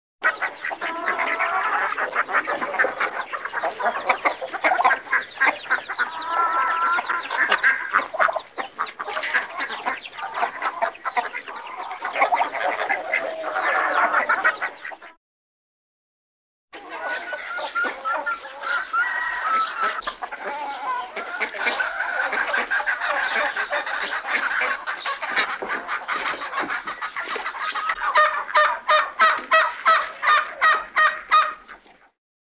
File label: Barnyard Chickens, Ducks, Geese And Birds, Animal; animals